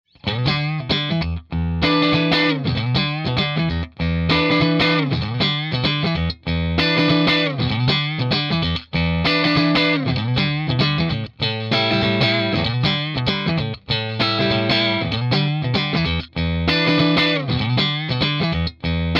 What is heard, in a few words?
Plexi 45 Super Clean; mojave